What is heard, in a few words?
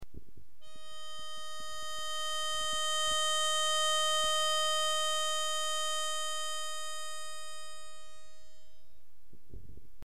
E Flat